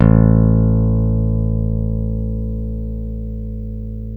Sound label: PICK BASS